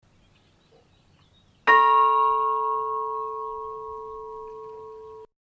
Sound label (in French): cloche; Chitray